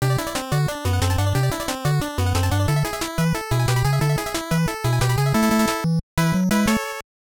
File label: you lose; Tecmo World Wrestling